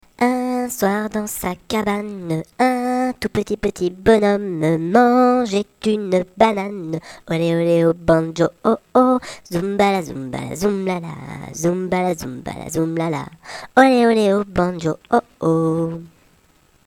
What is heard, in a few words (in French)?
banjo